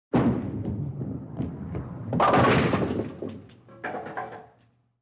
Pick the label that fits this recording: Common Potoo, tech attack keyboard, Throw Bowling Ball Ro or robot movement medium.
Throw Bowling Ball Ro